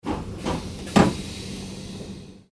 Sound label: underground door open